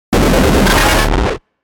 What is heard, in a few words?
Feedback Noise Harsh; Production Element Imaging Element Accent Transition; 5.1 STEREO MIX; Blastwave